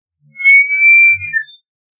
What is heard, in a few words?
giant eagle owl juv single call